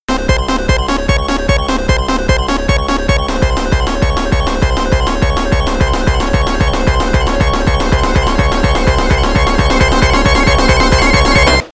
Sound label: Drowning; Sonic the Hedgehog 2 Anniversary Edition; Genesis